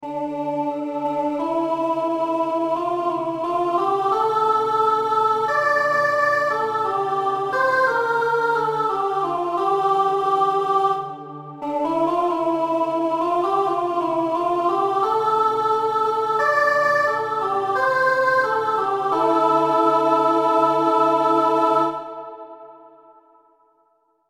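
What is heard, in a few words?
Soprano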